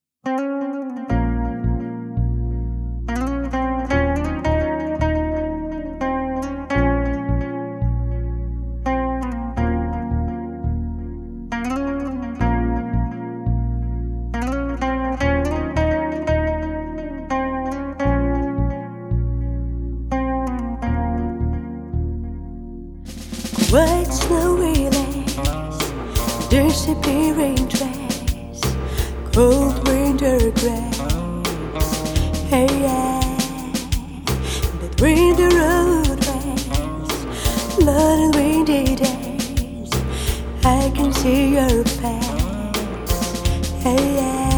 Singer; Moscow Singer

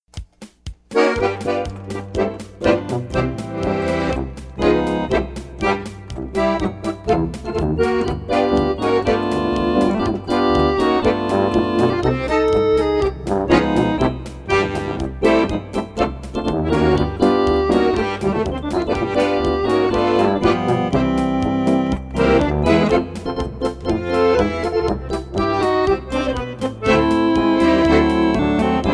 Polka Band; Denver

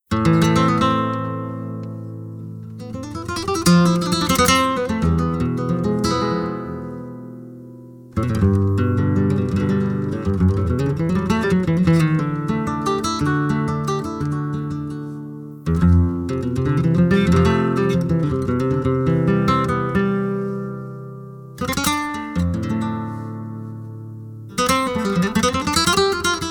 Tribute Band

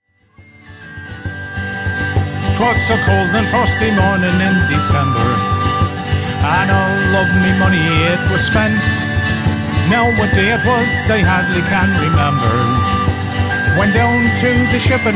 Irish Band; Chicago Irish Band; From humble beginnings as a Pub Band in 2001, this Chicago Irish Band has emerged as an exciting fiery group

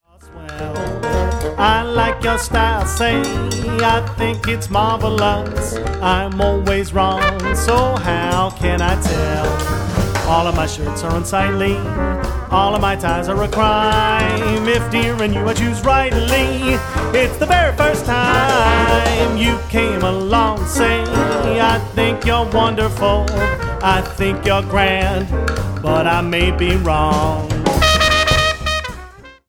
Jazz Band; Pittsburgh

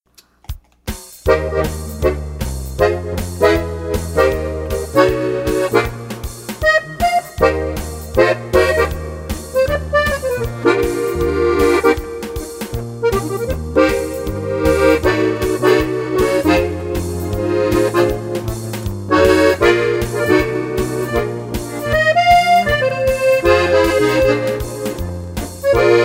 Italian Band; Denver; This Denver Italian Band evokes the spirit of the old country

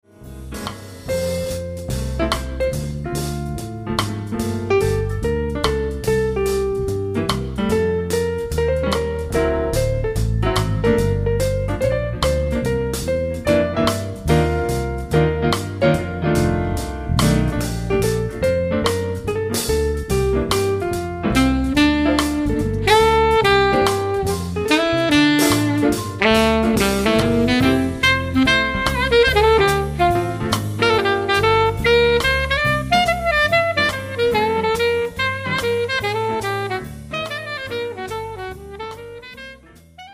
Jazz Band; Boston

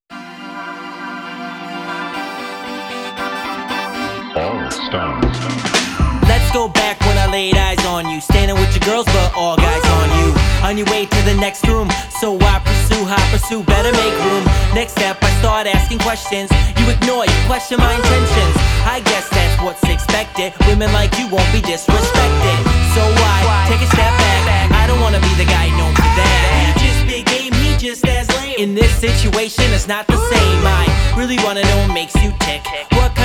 Hip Hop Artist; Chicago

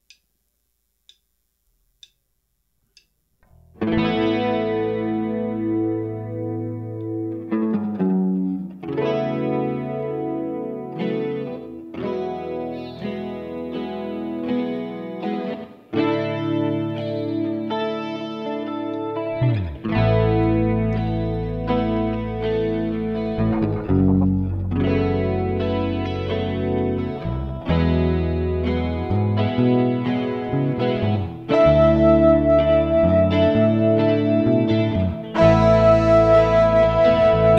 Country Rock Band; Danville Country Rock Band

